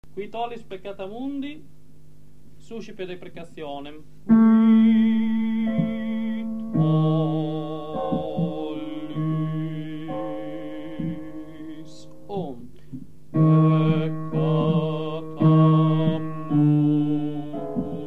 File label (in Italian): Qui tollis Bassi; Vivaldi; Gloria